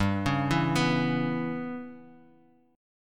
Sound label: G 6th Flat 5th, Bass Standard, G6b5 chord; G6b5 arpeggiated; JGuitar